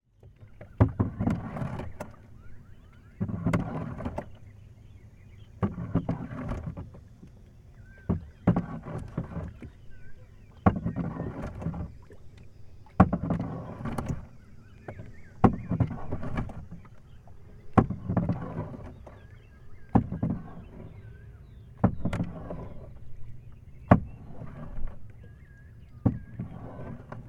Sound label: transport boat rowing oars scrape hit wooden body harbour few birds in bg